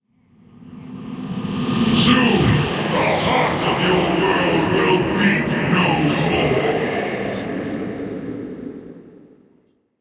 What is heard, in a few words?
warning